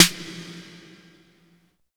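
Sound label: 808 snare; Phat & Phunky; East West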